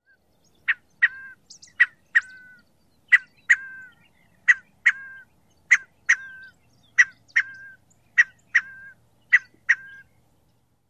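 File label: kek-kyurr call, Scaled Quail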